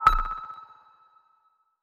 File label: torpedo; World Of Warships; weapons